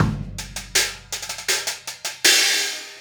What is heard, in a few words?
Hi Hat and Kick